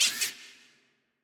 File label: Bats Hurt